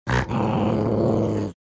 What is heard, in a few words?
Angry wolf snarling